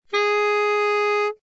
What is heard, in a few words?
A sharp 3, soprano saxophone